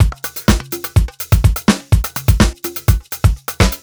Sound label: 125 la fine beat, Nu Jazz House; 125 Beats